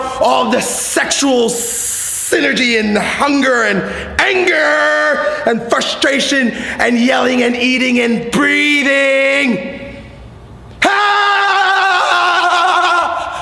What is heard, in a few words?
crazy breathing anger